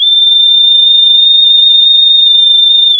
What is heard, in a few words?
pop, sound of a tea kettle whistling